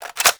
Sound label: Latron Clip Out; Warframe